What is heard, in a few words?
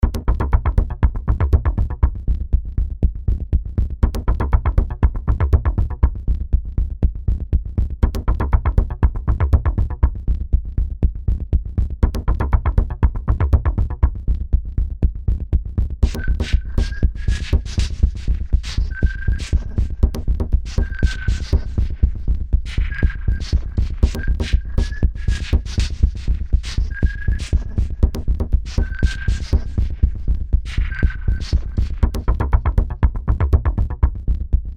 Ambience